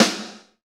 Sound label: SNR, Reverbs; Club-50 - Foundations